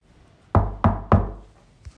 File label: new door knock